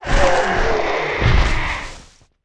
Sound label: harpy slave dead, monster